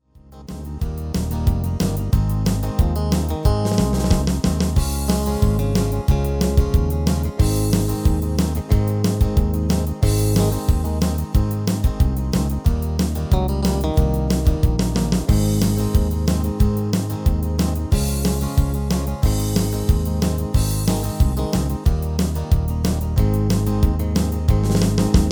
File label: MIDI